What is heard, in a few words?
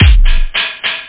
tr909 hi bassdrum